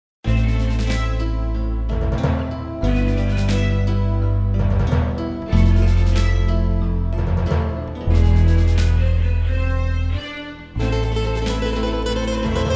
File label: Spanish Dream